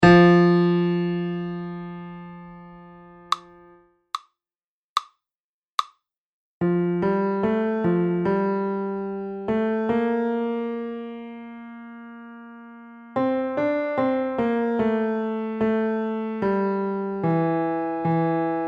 tenor; maine all state auditions

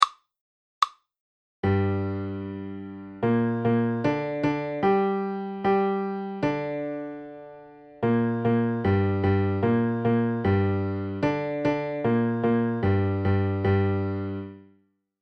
minor triad; intervals

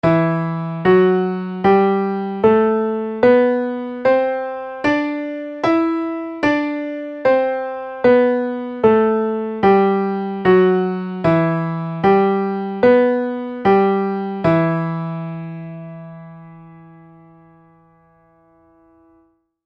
tuneup e minor; minor keys level 3

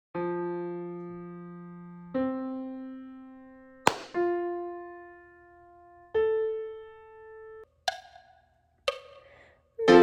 soprano solfege; lasso; o occhi manza mia

